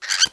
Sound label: farmiliar die